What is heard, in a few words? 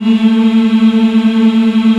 African Mm f; Roland LCDP11 Africa VOL-1